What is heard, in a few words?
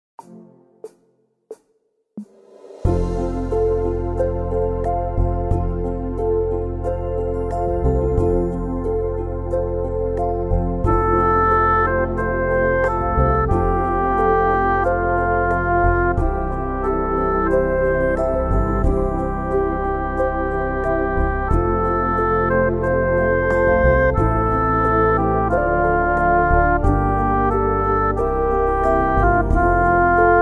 dvorak oboe